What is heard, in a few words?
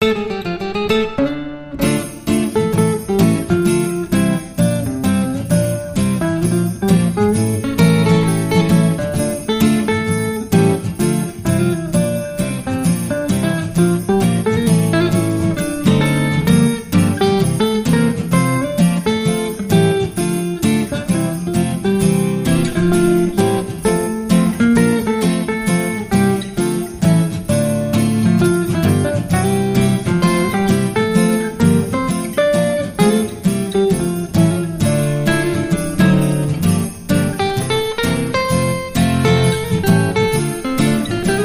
God Rest Ye Merry Gentlemen